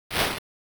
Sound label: Flip Whoosh; Robot 64